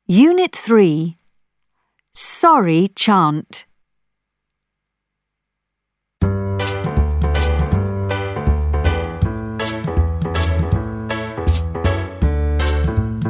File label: sorry chant